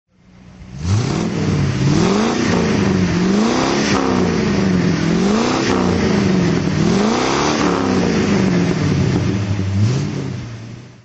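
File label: facelvega hk500 throttle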